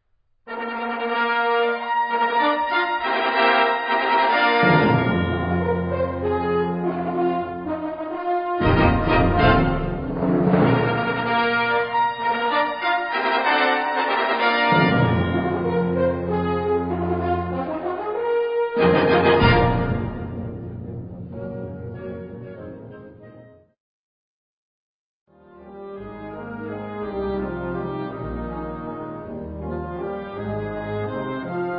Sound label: Organ Symphony